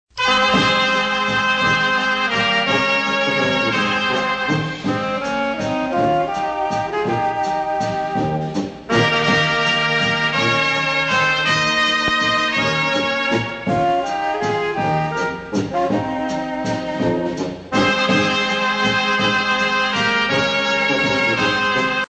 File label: WALTZ